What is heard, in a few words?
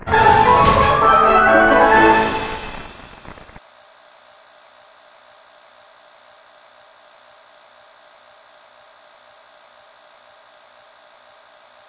freespin Intro; eggomatic